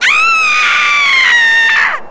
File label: scream; Falcon4